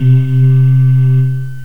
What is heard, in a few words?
Syn Vox, Ensemble; GMPlay